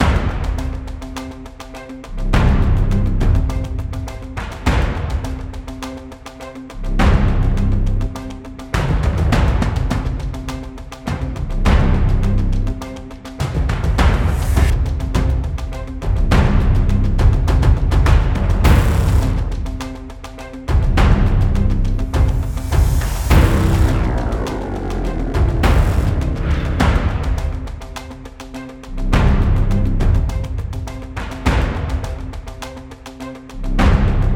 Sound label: Standard Combat Music Loop